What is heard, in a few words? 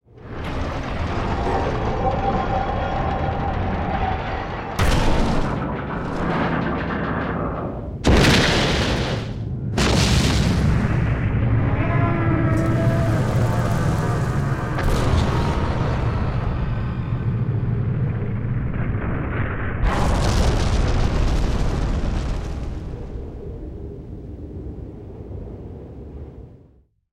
Evacuation